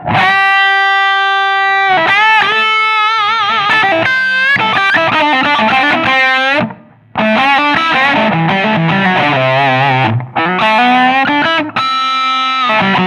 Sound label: OD Vol 12 Bright no Mid Boost off Jazz Treble 10 Middle 14 Bass 12 Level 15 Ratio 12; ODS; Presence 12